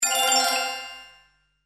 New Chat Message; Sony Ericsson; P900

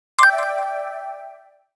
Email, Nokia Alerts; Windows Phone apps

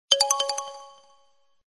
Elastic, ringtones

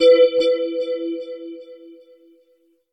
Fall, notifications; Highscreen; Power Rage

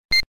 Self timer, shutter; ZTE F451

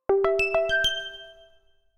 Alerto; Allview Soul-X6-Xtreme

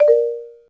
cam stop; LG

